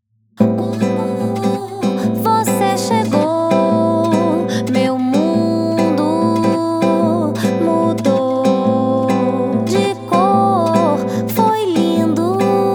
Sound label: accompaniment vocals